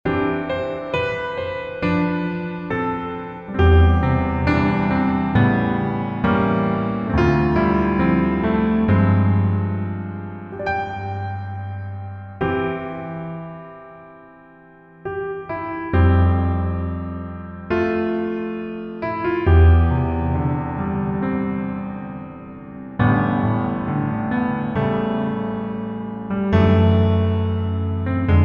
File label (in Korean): Piano ver 남자 키